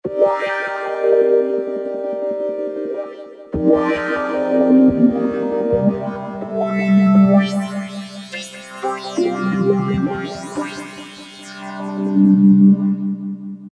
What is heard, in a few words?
Korg microKORG Future Pad; Factory Voices